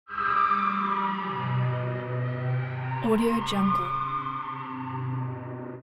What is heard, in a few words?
science fiction space far shuttle collide